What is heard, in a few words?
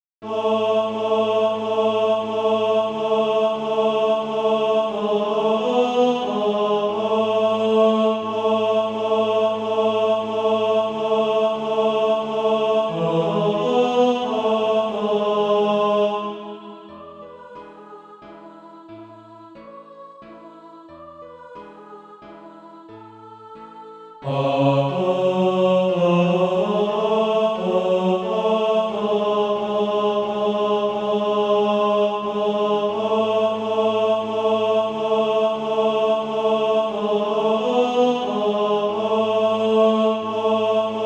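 tenor, Practise Music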